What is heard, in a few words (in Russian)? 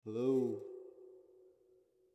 zvuki postukivaniia paltsem po mikrofonu